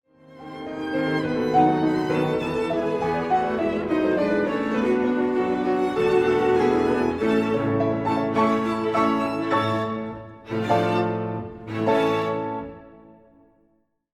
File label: cadences